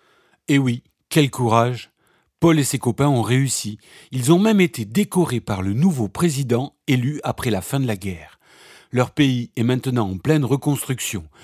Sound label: transition